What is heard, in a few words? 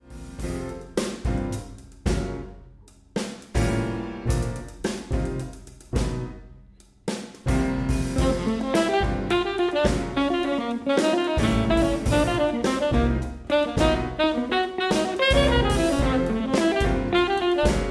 hip hop